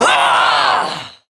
Throw, Barks; Kuva Lich; Persona C; Ability

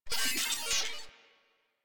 Proto Infested Mutalist Pain, Vocals; Warframe

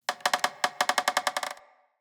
Amb Dead Tree Texture; Ambience; Mendicant Area

